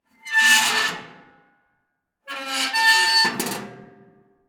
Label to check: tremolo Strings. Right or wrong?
Wrong